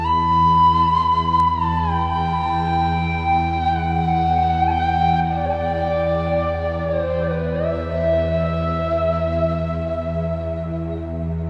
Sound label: flute, Sad